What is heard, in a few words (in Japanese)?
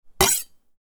包丁の音 その1; 日常生活の効果音